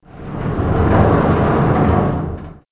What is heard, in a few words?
Large Elevator Door Loop; Doors